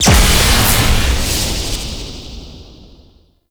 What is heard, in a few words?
Void Dragon Orb Proj Explosion Lightning; Enemies; Abilities; Warframe; Duviri